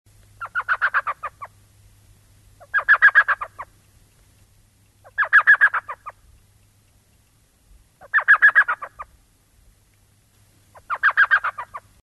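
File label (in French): chevêchette des saguaros micrathene whitneyi; chevechette des saquaros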